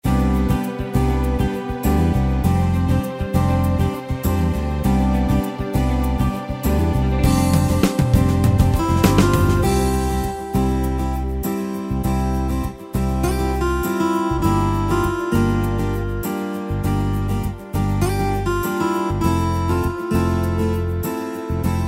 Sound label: Guitar Pop, Yamaha PSR-3000 Factory Styles; Pop & Rock